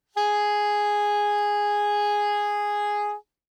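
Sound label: Soprano Saxophone non vibrato ff Ab4; Woodwinds; Bb Soprano Saxophone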